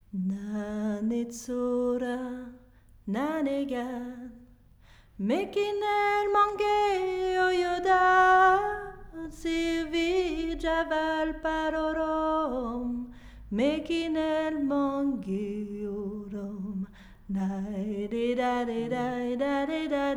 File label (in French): VOIX